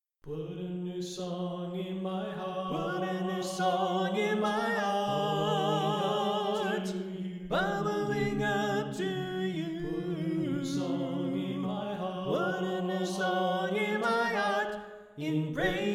Alto Left

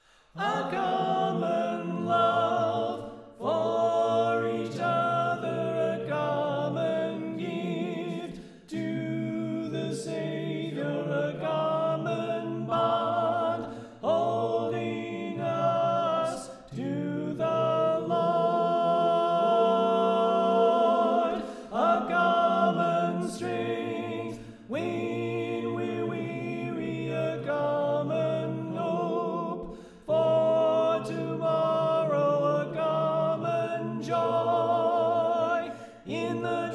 Alto Left

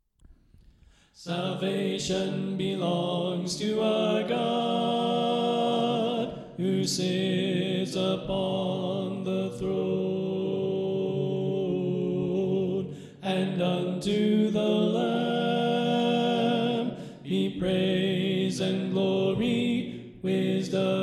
Tenor Left

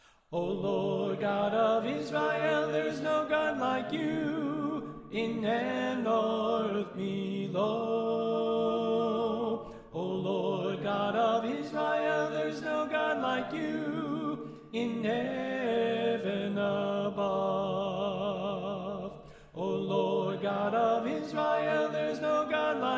Tenor Left

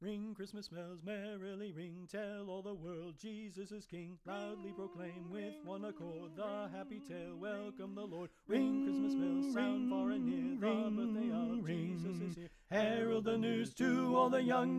Tenor Left

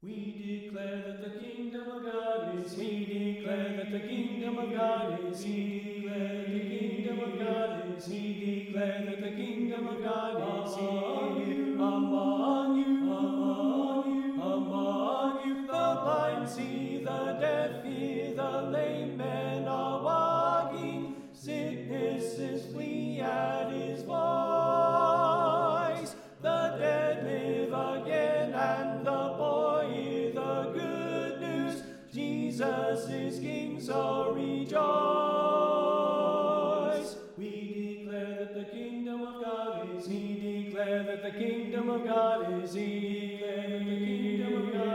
Alto Left

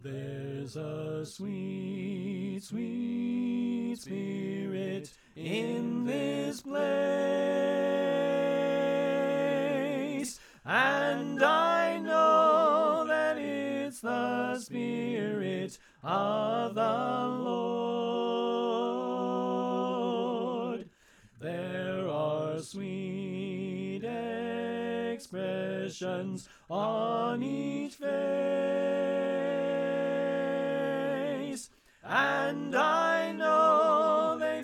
Tenor Left; Pennsylvania Groups; GQ Camp Reunion

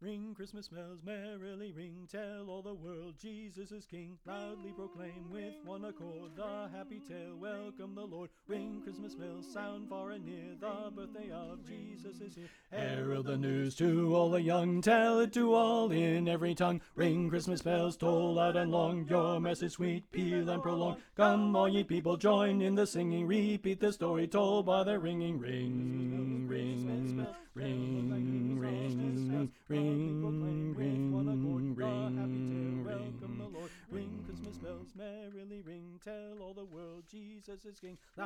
Bass Left

